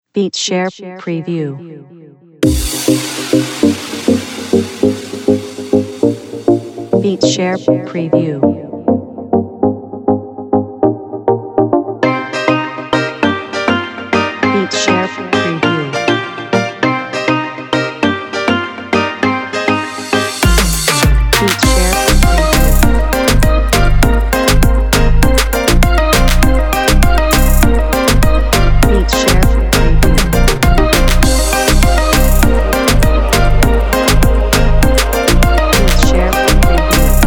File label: reggaeton bpm100 sample